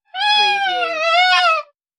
Sax Whine; saxophone

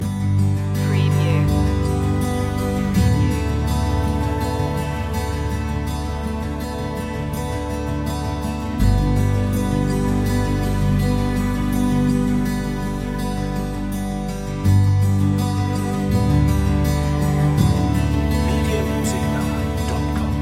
desert rose loop, romantic love emotional

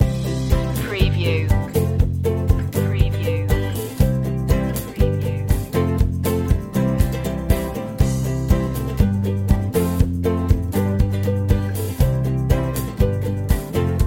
born to be happy loop; happy-go-lucky

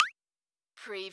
CARTOON DOINK

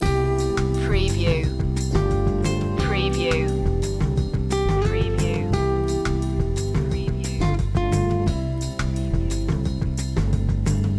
sunset drive loop, Easy Listening

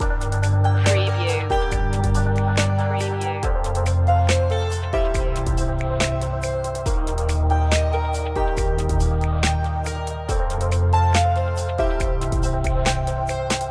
downtempo chic loop; electronica chillout

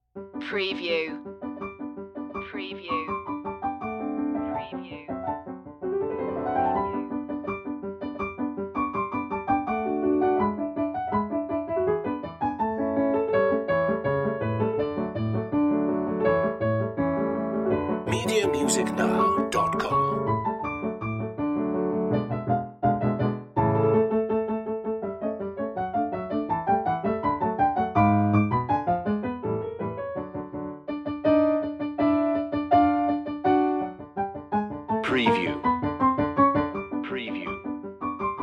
polka g major allegro; classical; strauss

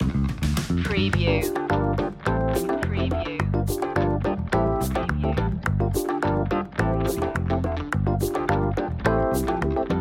classy night underscore; brisk

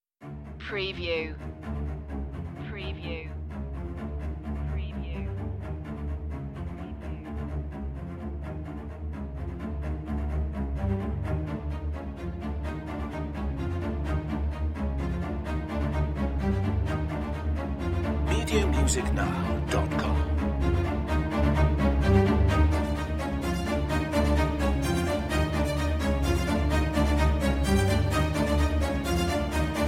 danger rising strings, suspense tension

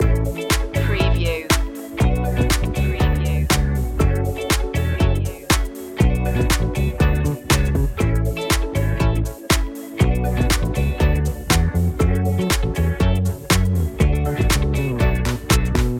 walk in the park loop, Pop Funk

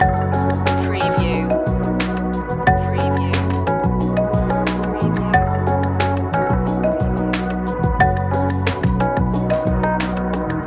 delicate flower loop, Drums and Bass, dreamlike

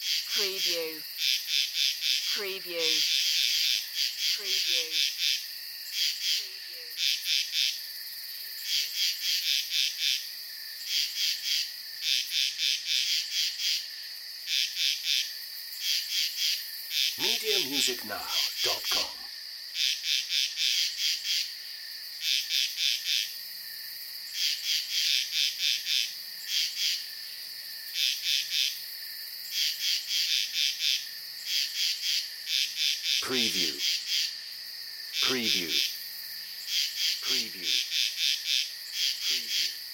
Night Insects Frogs; Sound Effects Bible

